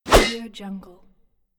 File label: axe swing